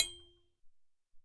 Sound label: Trian Mt, Alesis SR-18